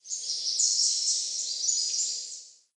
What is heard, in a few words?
ambience sanctuary birds